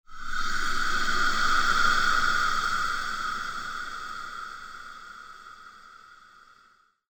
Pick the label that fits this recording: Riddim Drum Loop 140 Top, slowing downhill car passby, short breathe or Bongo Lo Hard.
short breathe